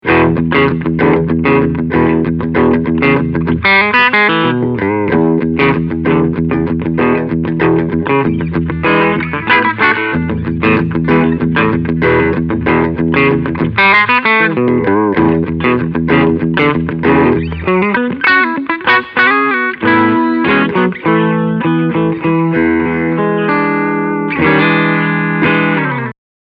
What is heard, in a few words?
Cleansound boosted